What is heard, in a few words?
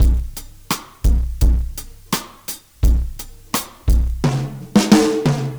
R&B Drums